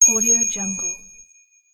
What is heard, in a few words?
twinkle star